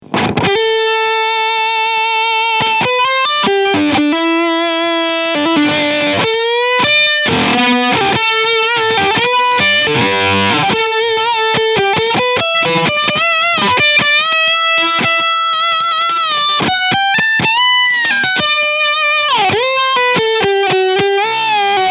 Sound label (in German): MXR Octavio Fuzz Pedal Full Fuzz MIT Amp Gain